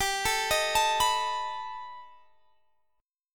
B 7th G; B7/G; arpeggiated

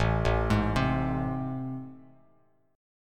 A Minor Major 7th A down, Bass; arpeggiated; AmM7; 5,0,6,5; Bass Standard; JGuitar

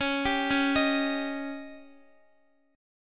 Db Suspended 2nd Flat 5th, Guitar; arpeggiated

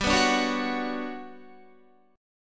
G Major Sharp 11th, Guitar; GM#11 strummed; 14,14,14,18,18,14

